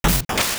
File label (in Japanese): 攻撃：味方